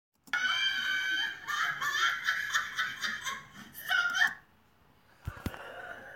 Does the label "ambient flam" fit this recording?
No